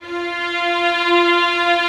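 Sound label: Vio legato F3; Digital Sound Factory Virtuoso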